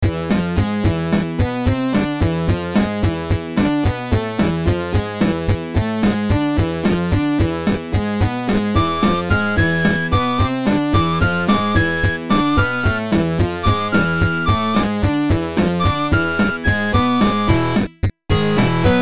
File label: chiptune